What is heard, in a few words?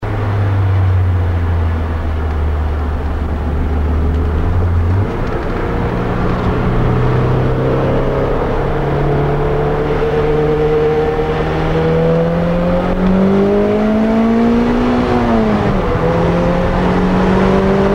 past truck, exhaust